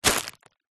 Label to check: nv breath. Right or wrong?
Wrong